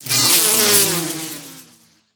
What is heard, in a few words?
Lifedrinker, Death